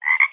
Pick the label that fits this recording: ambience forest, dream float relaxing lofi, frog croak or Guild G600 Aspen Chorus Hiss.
frog croak